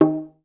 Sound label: VAS PIZ D#2 L, Orchestra; Best Service; Peter Siedlaczek's Advanced Orchestra